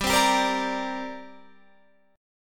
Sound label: D 7th Suspended 4th Sharp 5th, G